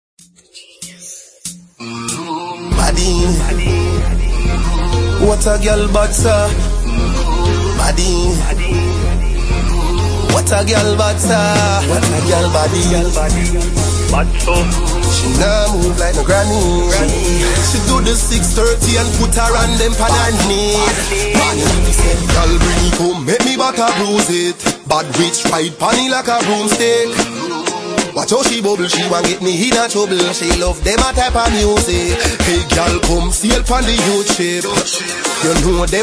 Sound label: raw bassline riddim